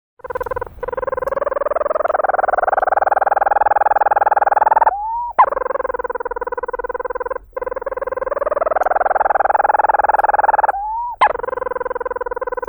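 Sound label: Leach's storm petrel, Oceanodroma leucorhoa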